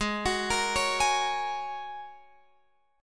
G sharp 7th Suspended 2nd Suspended 4th G sharp Guitar Open F; G#7sus2sus4; x,15,18,17,16,15; arpeggiated